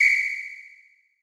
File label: WHISTLE, HR-16 DRUMS; Drum Mashines; EdgeSounds